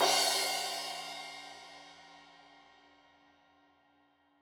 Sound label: Crash 15in L edge, Cymbals Stereo